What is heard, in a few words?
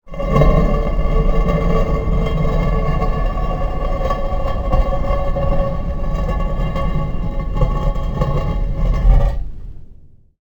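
very heavy stone door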